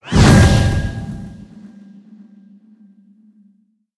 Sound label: fast air; valve; crash